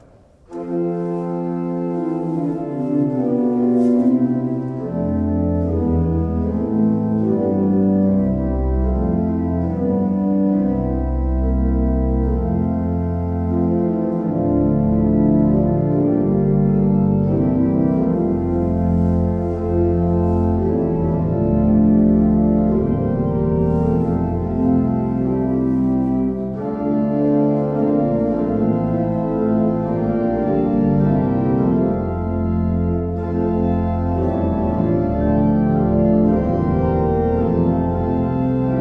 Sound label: pipeorgans; Euler 10 II P